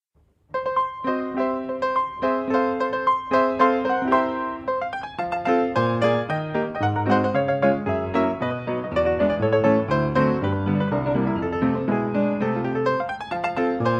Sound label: gavotte chromatic